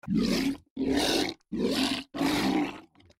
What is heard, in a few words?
gurgling